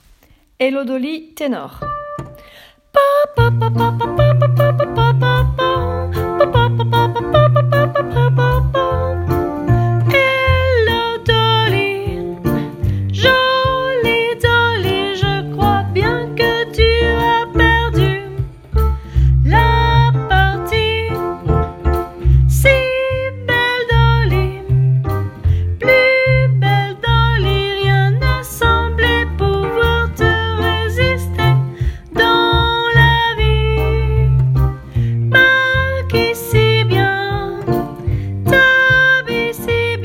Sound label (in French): Ténors